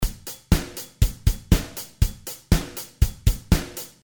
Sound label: 8beat